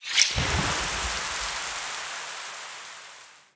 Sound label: fish heal